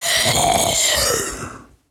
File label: Male Zombie; Zomboid 41.78.16; Project Zomboid